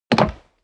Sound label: step leather shoes wood creak jump; schritte